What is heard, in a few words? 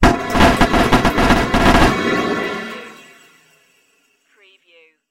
space pinball ricochet